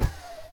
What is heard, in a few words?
Elevator Door Close